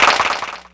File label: Clapbox Pitch 13; Ableton Foundation FreePacks